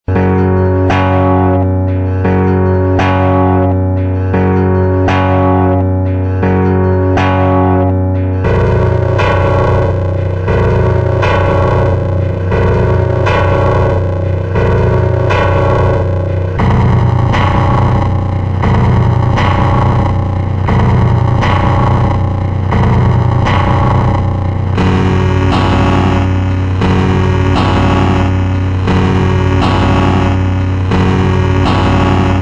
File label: Bent Guitar, Circuit Bent